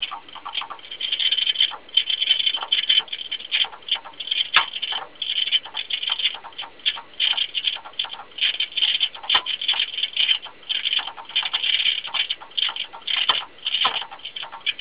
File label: hdd; neon